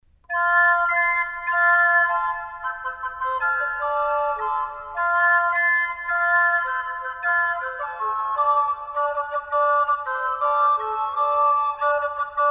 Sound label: Mollenhauer Dream Soprano